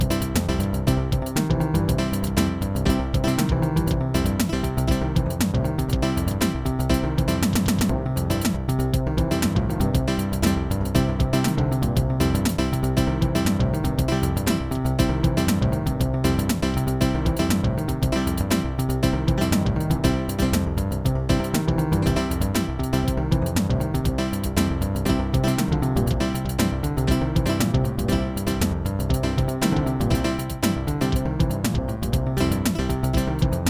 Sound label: funk style; SuperJAM!